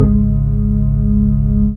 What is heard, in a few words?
SYN ORGAN; Sound & Vision - Gigapack II CD 1